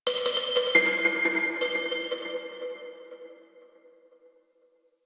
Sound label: Mallet; Warframe; Clan Dojo; Dojo Randoms